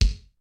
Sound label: kicks snare, kid; Kid Nepro; Elite; KICKS SNARES